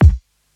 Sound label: kick; eddyflux; crate bd; dough-samples